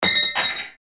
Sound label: wrenche falls down